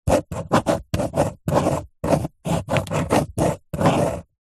office pen ballpoint paper hollow table draw diagram